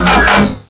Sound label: crossover switch open